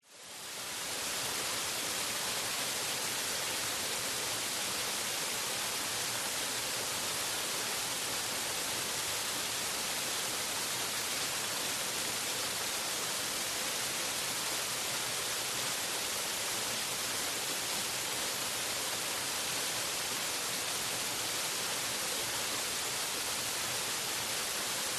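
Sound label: waterfall gentle rainforest jungle vietnam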